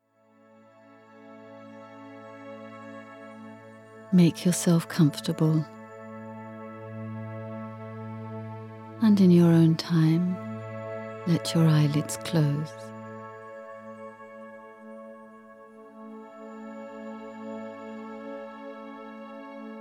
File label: Tuning